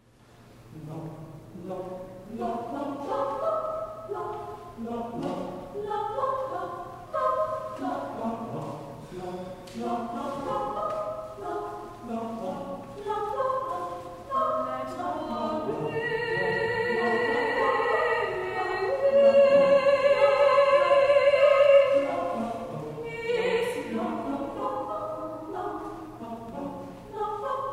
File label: April Rain Song SATB